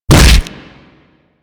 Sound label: Body Check B; Warframe; Grineer